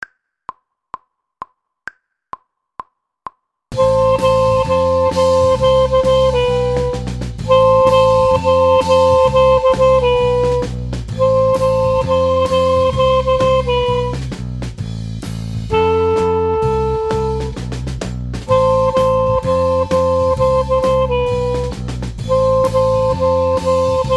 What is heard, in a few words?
clarinet